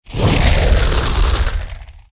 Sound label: Flame Breath FLAME; Evolve; Meteor Goliath